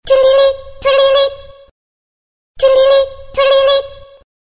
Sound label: trwing trwing